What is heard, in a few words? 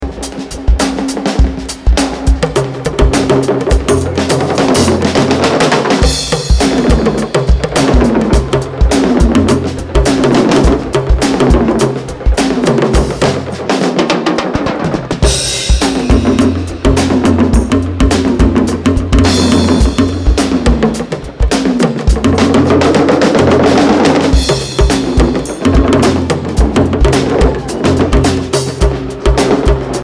Drum Dub